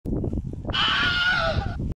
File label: Aaaaahhh falling sound effect meme